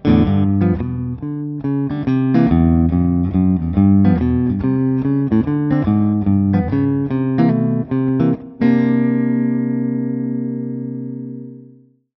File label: Archtop Clean